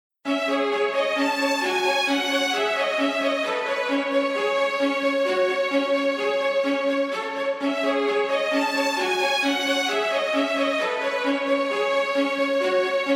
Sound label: bgm orchestra; maou